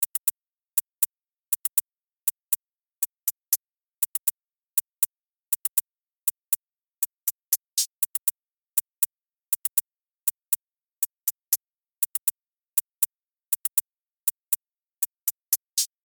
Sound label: Bounce Hi Hat Loop 120 BPM